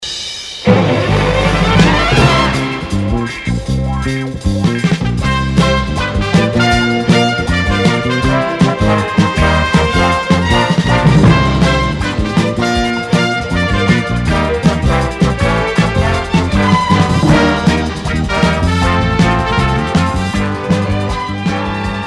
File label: news open; radio band of america